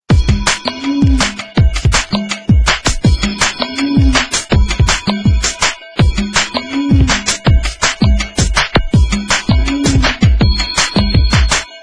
Concrete Beat, ringtones; Microsoft; Windows Mobile; LowFidelity-22kHz mono; Ring Tone Pack 2

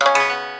gayageum, keytone

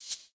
Unlock, ui; NEC Casio MEDIAS X N-07D

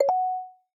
notifier hello; Mozilla; Boot2Gecko

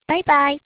shutdown; Sanyo; SCP-6200; Startup and Shutdown

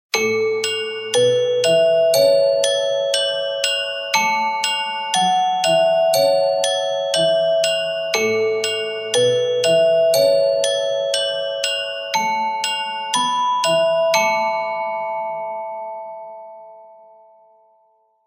Bells, Windows Mobile ringtones; Microsoft; Individual download-only (2009)

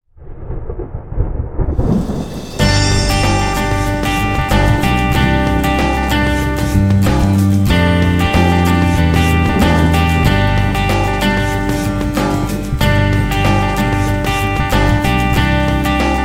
thunder

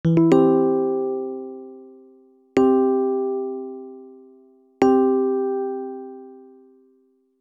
notification reminder; BlackBerry OS-10

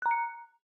vector unlock, ui; LG L18VC Classic US Celular

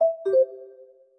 voice idle; LG K92 5G

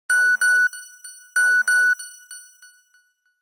Message

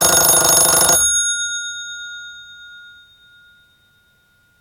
classic wallphone, ringtones; ZTE Open C

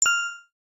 doremi star; SKY IM-A800S-VEGA-LTE; keytone

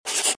Key Tone

